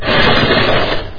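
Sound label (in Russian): совковая лопата шумный близкий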